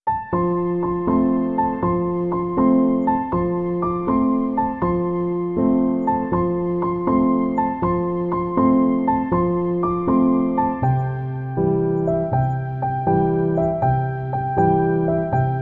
Relaxing Piano Lullabies

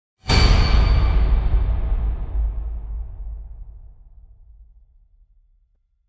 Infected Orch; Gothic Storm Music

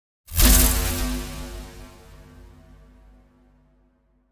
Impacts Abstract Explosion; Gothic Storm Music; Advanced Sound Design

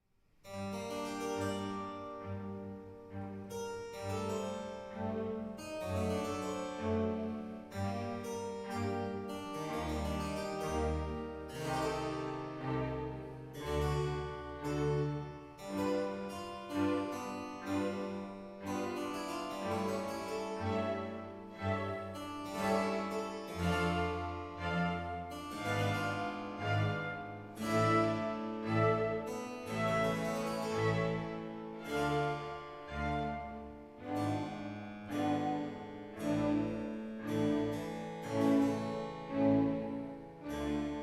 Concerto in G minor for 2 violins, cello, string; Antonio Lucio Vivaldi; L'Estro Armonico Concertos Op. 3; Concerto No. 2 Op. 3 in G minor RV578 for 2 violins, cello, strings, & b.c.

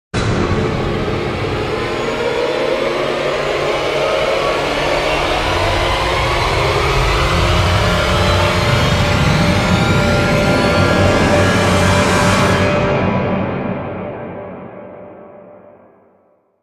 Orchestral Riser; Epic Tools vol. 1

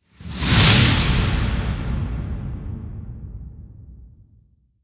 Thrown Wipe; Ninja Tracks

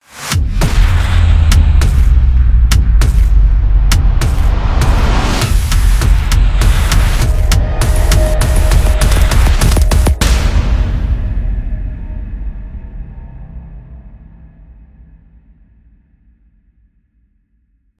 Pummeling No Rise; Ninja Tracks

